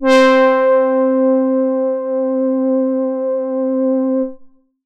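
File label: brass C4, ARP 2600; Polyend; Simulacrum Sounds; 1 Shots; Synths; Keys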